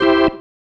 Stab, Organ, One Shots

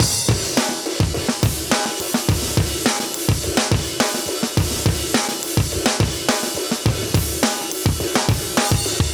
105 live breaks

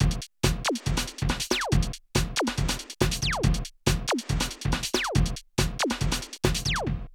Drum loops, Rave & Hardcore

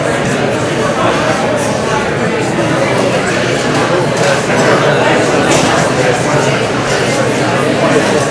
BIG RESTAURANT, RESTAURANTS; AKAI S-Series CD-ROM Sound Library VOL-6

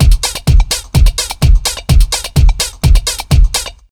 BEAT, Disco House; USB Soundscan vol.20; AKAI

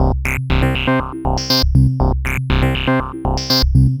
Synth

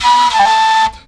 FLUTE LIN

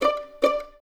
Violins D5 Pizz, String Ensembles; Miroslav Vitous; 11 Violins

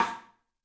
MARFSN, 3D Drums, X STICK; 3D Sound

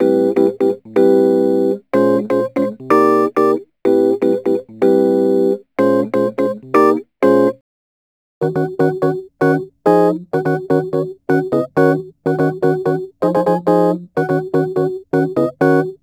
Rhodes Attacks; Ueberschall

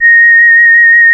Synclav FM, WIND, A#; Big Fish Audio

